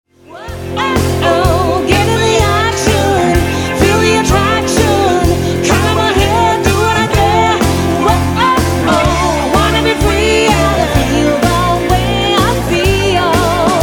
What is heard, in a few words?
country rock